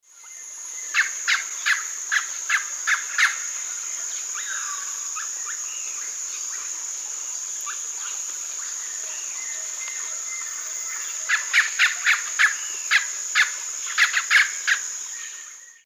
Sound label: Psarocolius angustifrons, calls; S. America; Eastern Lowlands